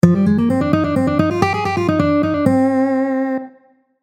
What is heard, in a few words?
c aeolian